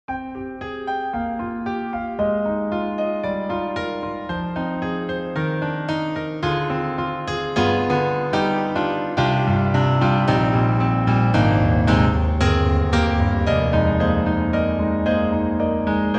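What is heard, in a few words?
Roland Juno-Gi Stage Piano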